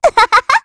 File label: Laugh, kr; Estelle; Kings Raid